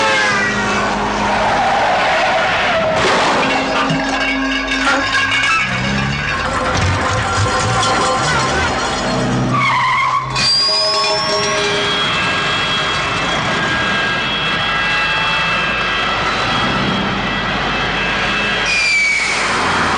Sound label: ikiosk jingle